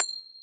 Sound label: effect symbol hit jackpot